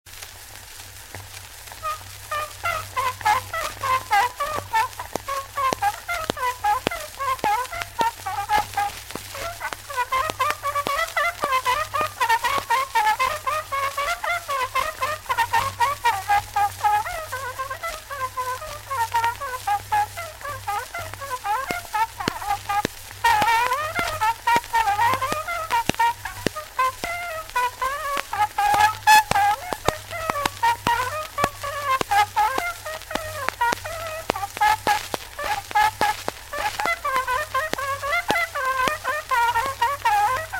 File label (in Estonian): Maavalts sikusarv; Suure Jaani; folk; Jaan Parbo